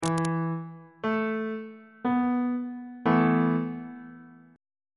g double sharp suspended 2nd 2nd inversion on bass clef